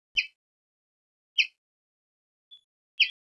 call, chaffinch, it is mostly uttered in flight as contact call, but also when perched; ALTITUDE: 0 m.; fringilla coelebs